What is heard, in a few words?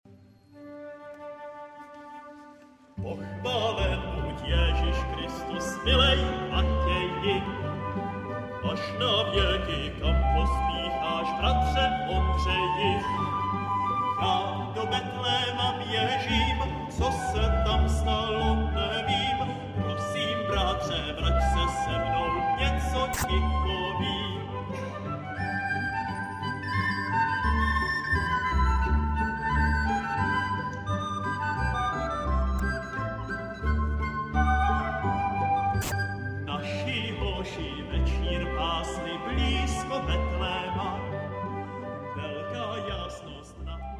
hled male jezulatko; 20. Pochválen buď Ježíš Kristus